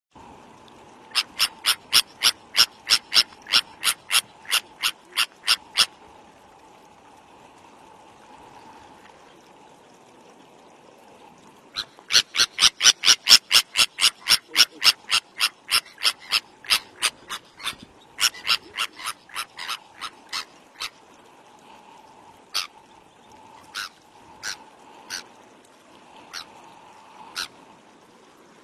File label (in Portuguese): Canto da gaivota de sabine